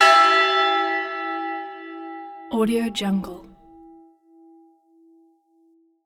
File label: christmas church bell